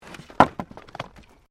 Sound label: Wood Movement Minor; Material components for constructing more complex sounds; Materials